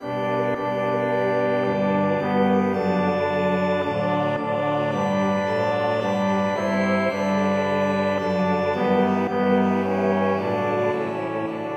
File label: polyinstru, hymns; O Lord we adore Thee